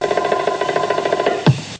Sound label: spy bongos